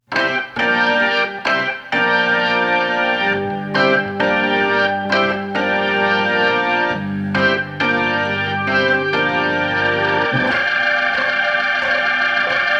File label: organ & drums excerpts remix